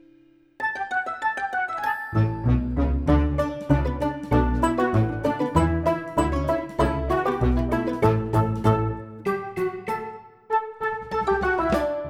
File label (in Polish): plas bez wokalu; szesciolatki; multiprzewodnik; akomp